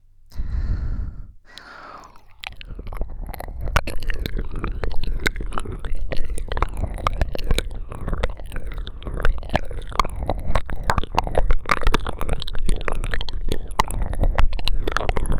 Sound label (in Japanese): 小脳舐めコース